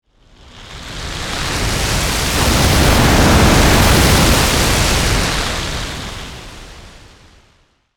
Meteor Approach, Scifi, Meteor, Approach, Break, Atmosphere, Pass, By, Science, Fiction